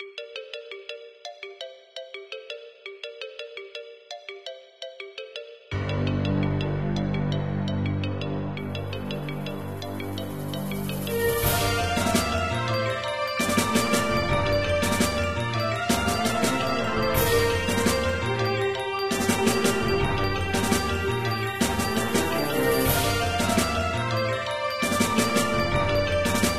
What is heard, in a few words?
dungeon; MapleShrine